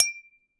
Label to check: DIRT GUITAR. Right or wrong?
Wrong